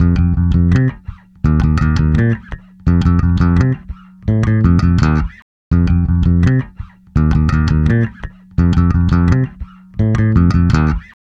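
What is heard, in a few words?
Pop E-Bass, F major; Pop Rock; Pro Tools Essential Loops; Digidesign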